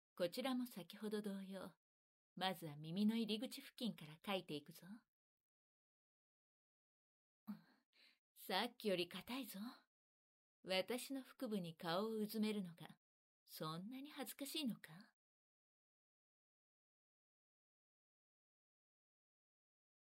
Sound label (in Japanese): 右耳かき